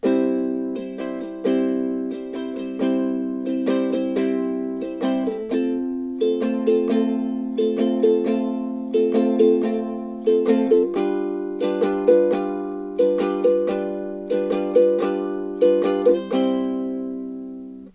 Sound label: swing 4/4, ukulele